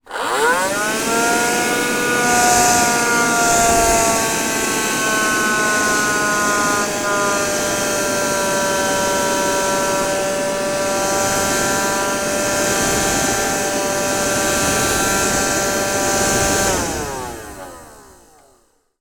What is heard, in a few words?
household dustbuster handheld vacuum cleaner on operate off low battery